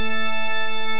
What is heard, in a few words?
doppler base